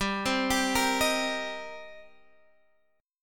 D sharp 6th, G

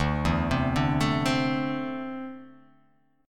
D 7th Suspended 2nd down, chord

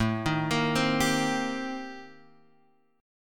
C Minor 7th A; Cm7/A arpeggiated; JGuitar

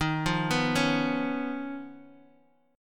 D sharp 7th Suspended 2nd Sharp 5th Bass Standard; arpeggiated; JGuitar

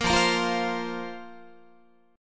F Suspended 4th F, Guitar; Fsus4; strummed

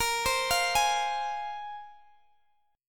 Bb 7th Suspended 2nd Bb Guitar DADGAD; Bb7sus2 arpeggiated; x,x,20,17,20,18

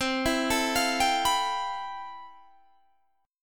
F Major Sharp 11th C

